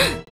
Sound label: Grunt; Lewd SFX